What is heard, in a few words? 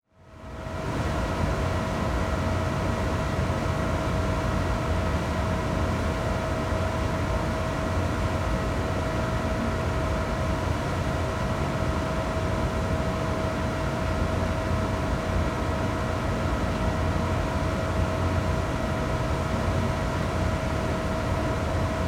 Industry Ambience Factory Boiler